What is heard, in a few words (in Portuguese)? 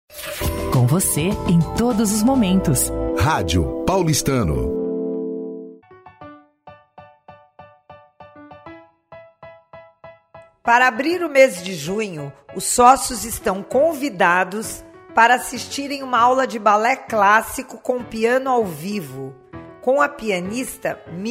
aula de balé clássico com piano ao vivo; RADIO PAULISTANO